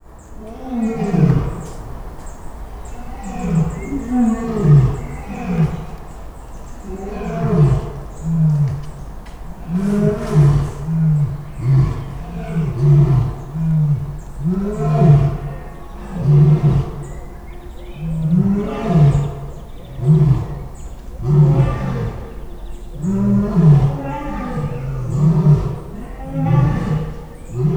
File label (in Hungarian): bömbölés, oroszlán; szegedi zoo 2011